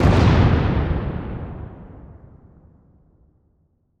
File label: Explosion Volcano; Fire and Explosions